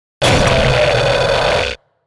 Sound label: Fuzz Crunch Warp; Warped; Blastwave